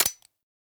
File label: Viper Fire Mech, Weapons; Warframe; Vipers